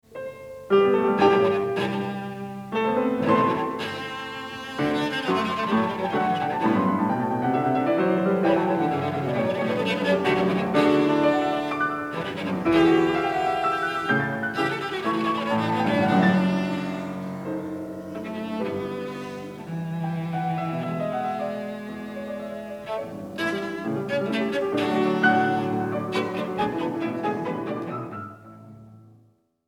Cello sonata No. 5 in D Op. 102 No. 2 I. Allegro con brio; Beethoven